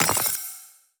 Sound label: ui collect resource